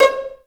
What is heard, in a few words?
JD PIZ, STRGS